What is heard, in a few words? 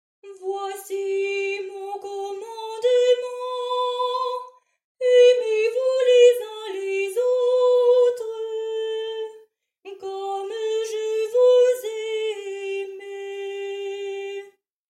Soprano